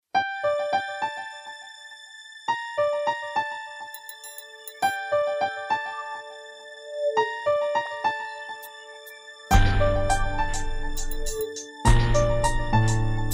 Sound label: piano